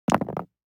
DICE on Neoprene, Throw and Roll, Standard, 2 Two Dice; Samples; ArticulatedSounds; DICE Sfx Party (Extended)